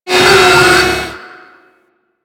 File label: Shipyards Robot Malfunction; Ambience; Props; Warframe; Grineer Shipyards